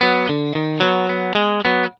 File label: ROCK B 110, Vintage Blues Guitar; USB Soundscan vol.22; AKAI; ROCK2